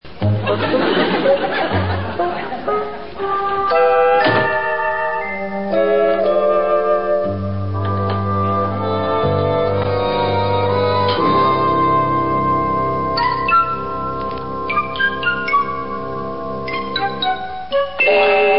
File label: music